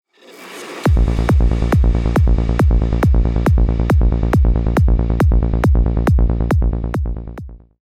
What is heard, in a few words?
kick bass; sound design